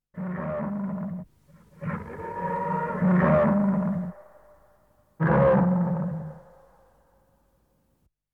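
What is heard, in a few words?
elefanti elephants; animali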